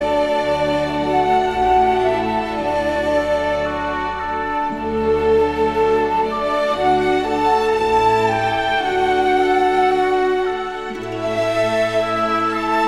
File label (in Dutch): Adagio klarinetconcert